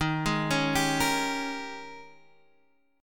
G sharp Major 7th Suspended 2nd Suspended 4th

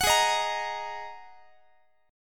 Ab 7th Suspended 2nd Ab, Guitar; Ab7sus2 strummed; x,x,21,18,19,21

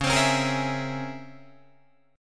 C sharp Minor Major 13th C sharp Guitar DADGAD; C#mM13; strummed

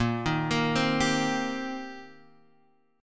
Eb 6th Bb, guitar

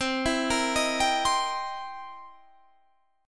C 7th Sharp 9th C Guitar Drop D

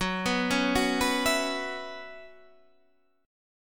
F sharp 7th Suspended 4th; arpeggiated; 14,14,11,11,12,12; F#7sus4 Chord

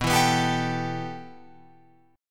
B 7th Suspended 4th Sharp 5th, Guitar, Open F; B7sus4#5; strummed; JGuitar

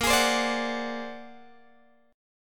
A sharp Augmented 9th A sharp Guitar Open G

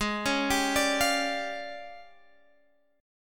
D Minor Major 7th Double Flat 5th, G sharp

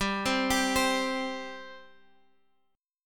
C 5th G

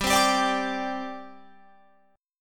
G Suspended 4th G down Guitar Open F; Gsus4 strummed

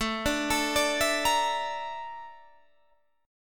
Bb Major 7th Flat 5th A

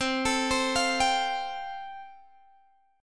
F Major Add 9th C, Guitar Standard; Fadd9/C arpeggiated; JGuitar

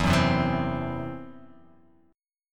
E Suspended 2nd Sharp 5th E, down

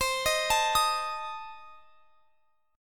C Minor 6th, chord; Cm6 arpeggiated; x,x,22,20,22,23

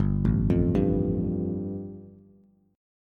G 7th Suspended 2nd G, Bass; G7sus2 arpeggiated

